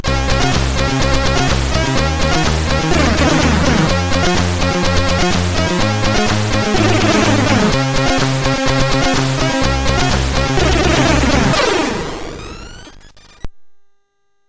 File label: Game Music, sid MOS6581R2